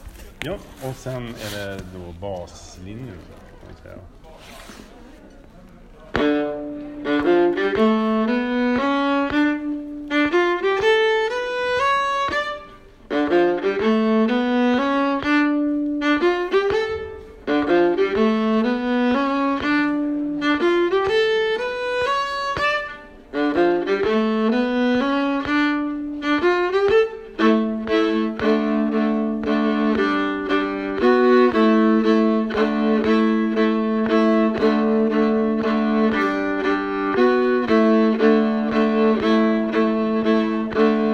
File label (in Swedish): Ackord Riff